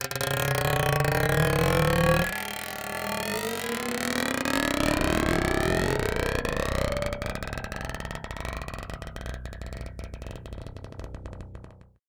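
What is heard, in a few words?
Yamaha scrape, Bass; Masterbits CLIMAX 7; PATITUCCI BS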